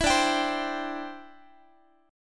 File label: D 7th Suspended 2nd Suspended 4th D, Guitar; D7sus2sus4 strummed